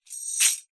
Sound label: foley keys large bunch prison guard catch hand